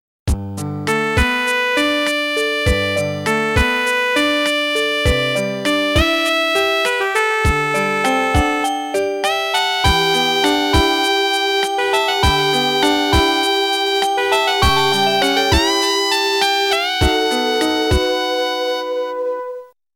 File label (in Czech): melodie